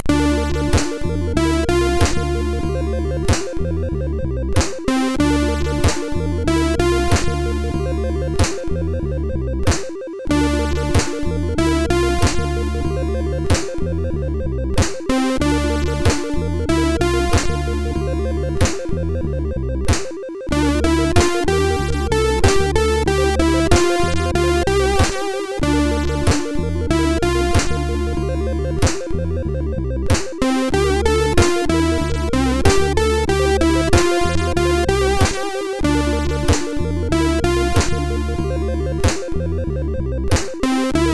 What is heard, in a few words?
Lazy Night, sid MOS6581R4